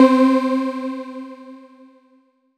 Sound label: SYN, Synths; M8; Electronisounds